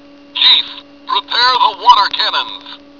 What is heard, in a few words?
water cannons; Rescue Roy